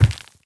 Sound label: walk middle; left